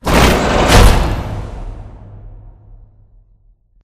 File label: Assembly Wall Rail Close; Titanfall 2; Boomtown